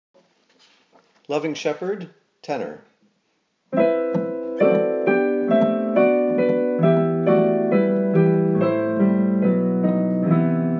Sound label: Tenor; Ledger; Loving Shepherd